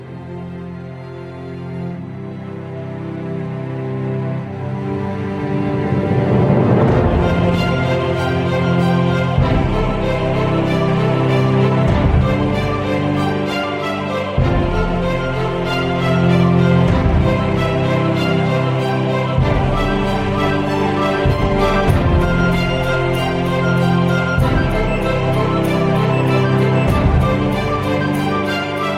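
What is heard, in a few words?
Orchestra Ringtone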